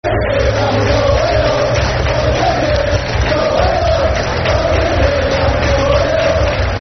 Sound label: 100 Years And We're Going Up [Football Chant (Soccer Song)], Rochdale